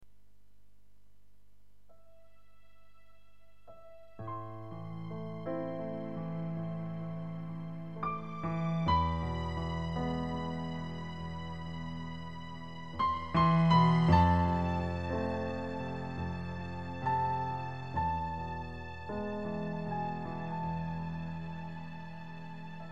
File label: piano